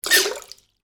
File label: toy duck squeeze out water